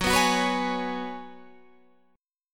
Gb Suspended 2nd Suspended 4th Gb, Guitar Standard; Gbsus2sus4 strummed; 14,14,16,16,14,16